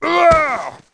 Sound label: Druid of the claw death